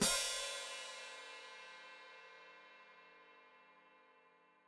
Hat Closed Open, Hi-Hat

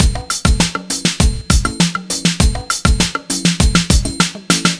100 Full, Miami Drum Machine, Drum Loops 100bpm; Zero-G; Soundclash Nu Dance Electro; Acidized

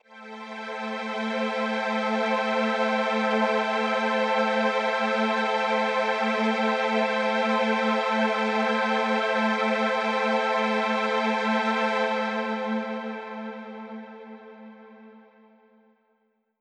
Mach String A min, synth orchestra

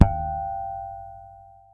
HARMONIC G, PEDULLA BASS; Masterbits CLIMAX 7 - Bass

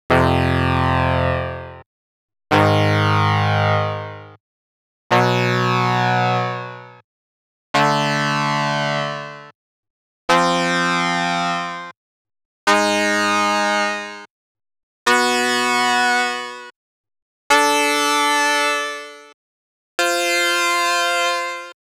Dirt Sax PPG F+3; Klaus Schulze Collection

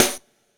SD, dance drums hip

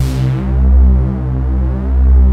BIG FUZZER; Pro-Rec - Synthsonic

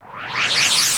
NOISE, Production Effects

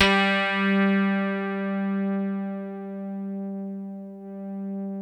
DIGI RHODES; Sound Gallery - Charged

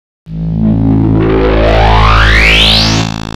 FREDDY FILTER, Transwaves; Soundengine - CSR-000 The Art of Transwaves; HARD MOD

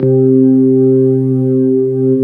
B3 HAM, Keyboards Old School

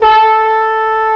CORNET; CMI Sound Library Rev 1.3; BRASS

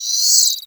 LI L BIRDY, CARTOONY; Spectrasonic Distorted Reality 1

